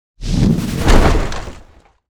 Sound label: tank debris; factorio 2.0.55